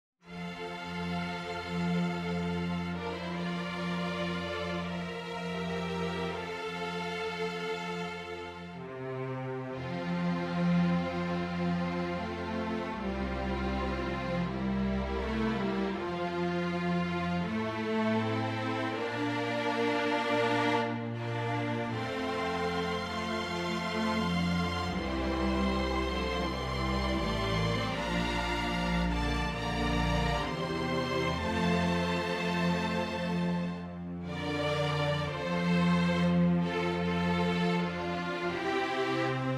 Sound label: Auld Lang Syne String Orchestra